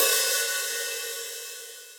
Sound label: Hihat Open DeepSnareDynamics; Drum Hits; Standard Hits; Ableton; Factory Packs; Session Drums Club